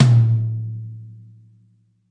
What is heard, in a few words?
Mid Tom, classic hip hop; STYLE KITS; Classic Hip Hop Mega Kit